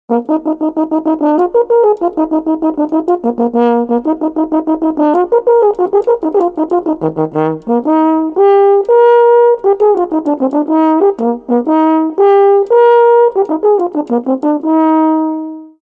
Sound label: french horn